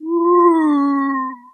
"woo" ghost; ooh ahh; ho hoo woo

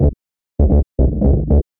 idiot bass; Loops; Electronic

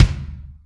Kicks Loud

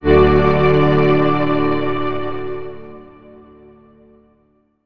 hidden organ; Notes

